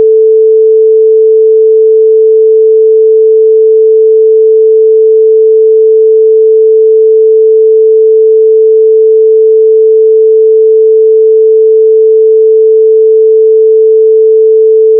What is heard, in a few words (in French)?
sinusoide 440hz